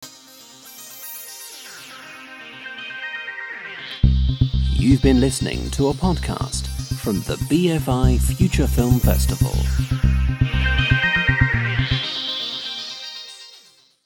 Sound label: outro jingle; BFI Future Film Festival